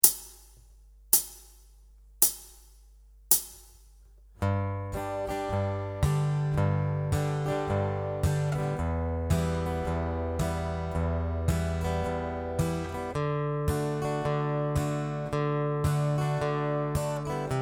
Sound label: Bass Note Picking